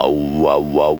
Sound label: OWWOWOWOW, VOCAL